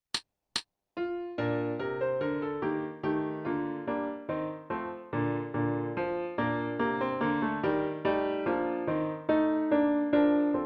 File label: Menuet Full Tempo with Solo, F Horn; Mozart